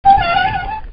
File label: wheel squeal